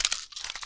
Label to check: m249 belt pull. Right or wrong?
Right